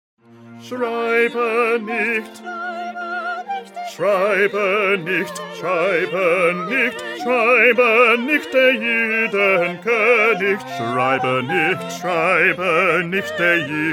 Bass; Bach; St John Passion

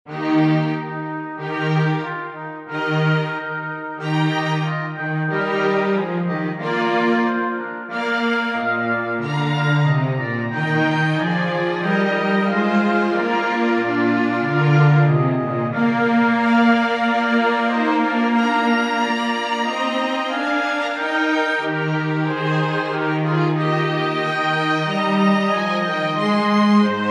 instrumental; Handel; Messiah

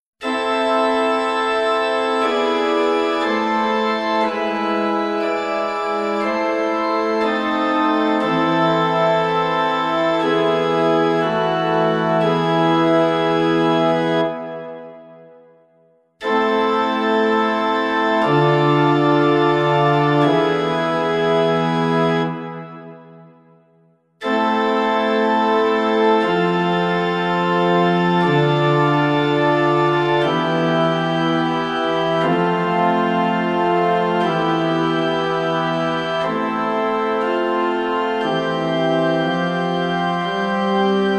Instrumental

